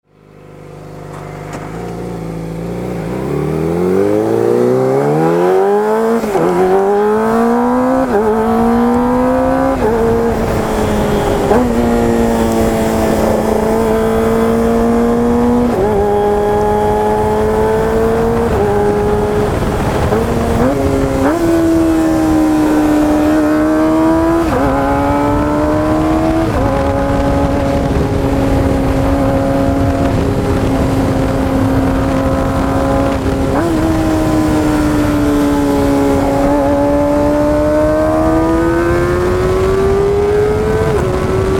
zx25r muffler silent acceleration; zx250e; beams

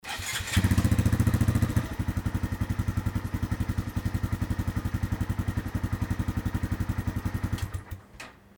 monkey125 idling

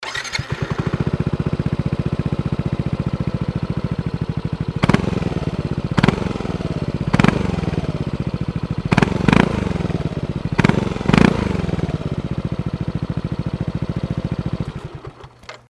nmax r-evo

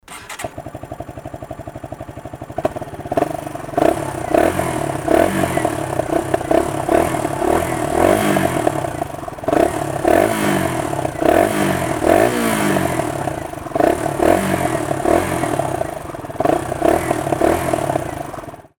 klx125 barrel4s

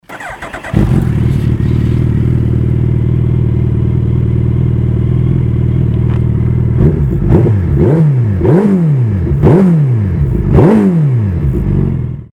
hayabusa custom

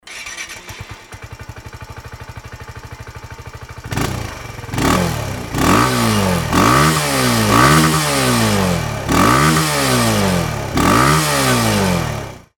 z125 normal; z125pro

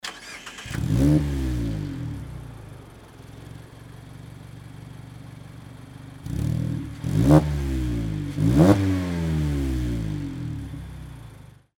nd roadster; nd5rc; oris

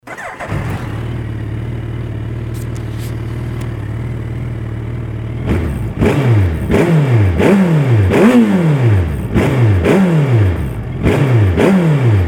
hayabusa custom

